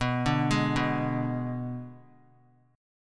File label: B Minor 6th B; Bm6 arpeggiated; JGuitar